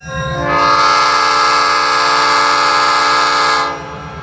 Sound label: horn